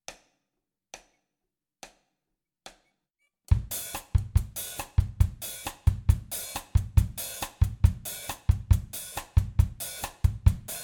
samba

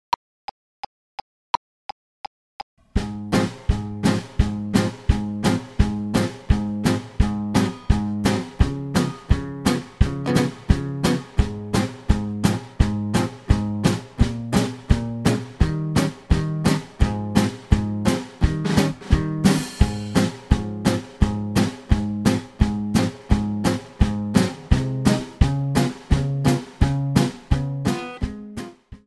blues two beat

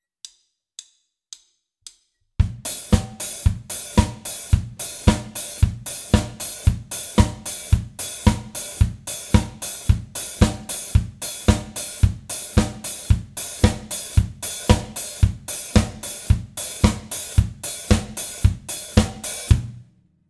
70s discogroove

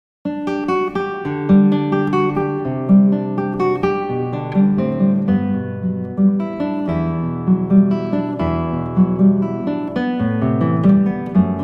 swallow falls

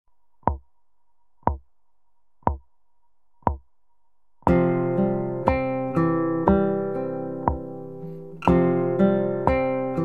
picking

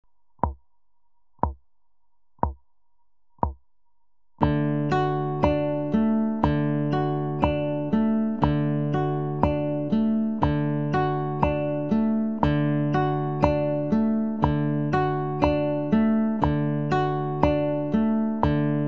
picking; Picking Nr. 2

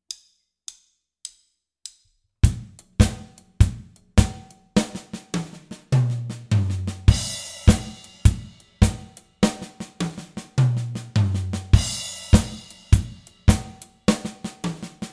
shuffle

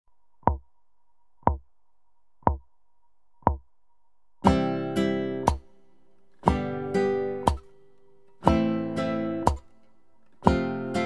strumming

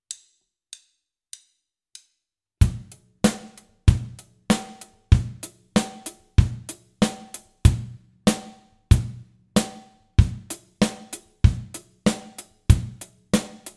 grooves

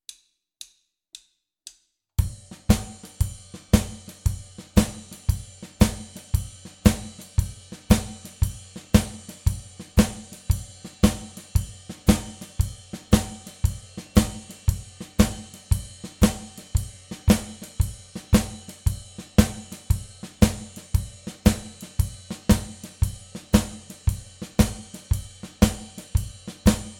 shuffle

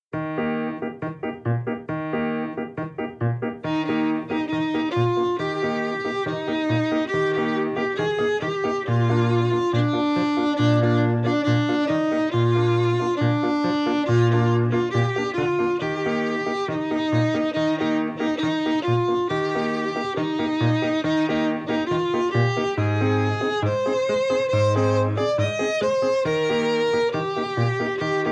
bossa nova

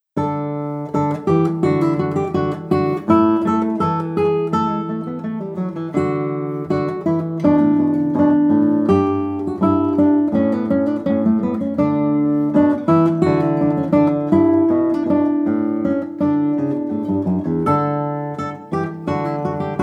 basse dance

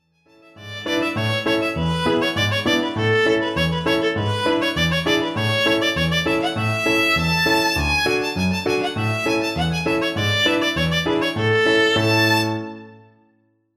capriccio

